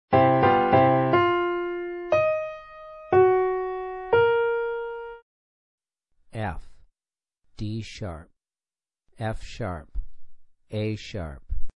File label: 4 Note Mel Piano Maj f3 d#4 f#3 a#3; ear training four note melodic